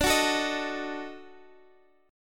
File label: D sharp Minor Major 7th Flat 5th